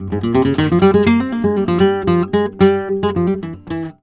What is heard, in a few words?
Flamenco Guitar Frase; Best Service ProSamples vol.46